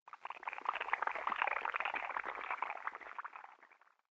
red deep jungle

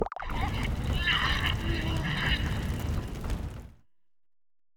beholder death, monster

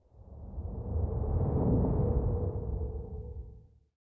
empty ruin; forgottenruin

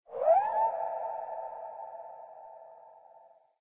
cemetery, desert

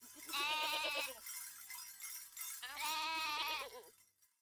entrance, snow

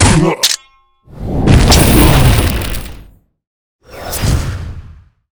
guardian Thoth deth, monster

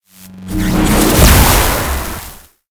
bolt atk

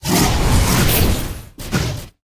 Boss Mech Tyranno, monster

